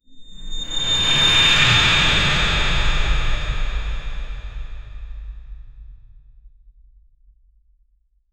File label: magical portal whoosh sound